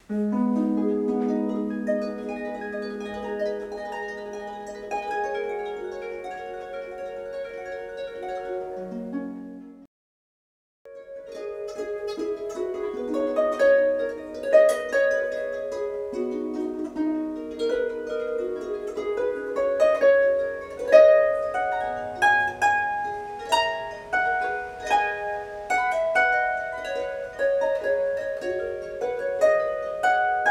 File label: Double 2x 26 string, Harp